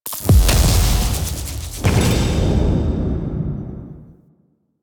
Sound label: Cephalon Puzzle Action; Warframe; Infested Eris; Cephalon Crypt Puzzle